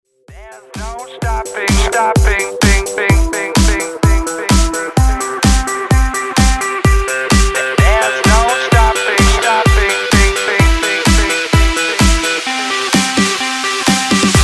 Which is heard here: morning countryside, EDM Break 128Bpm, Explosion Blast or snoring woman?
EDM Break 128Bpm